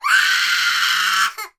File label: human 18 month toddler boy scream